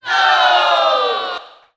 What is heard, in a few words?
"oh", group of people; ooh ahh